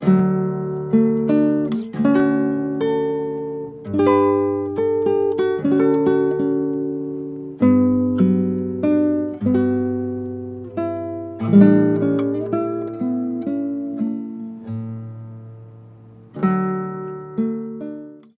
Lute Song

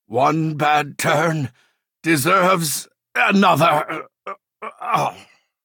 traitor last gasp; mournhold

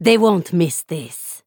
attack; khajiit infiltrator

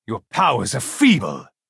attack; sunhold sorcerer

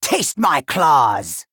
attack; black marsh warden

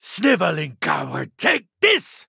attack; guildsworn oathman

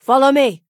attack; porcia imprisoned blademaster

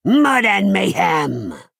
attack; stormhold henchman

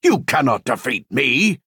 mage attack; indoril; Prophet of Bones Creature (Dark Elf)

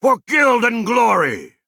attack; merric-at-aswala

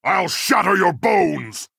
attack; gortwog gro-nagorm

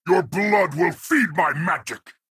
blood magic lord attack

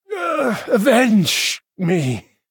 last gasp; sadras agent

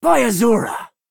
attack; adoring fan

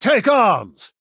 attack; sadras agent

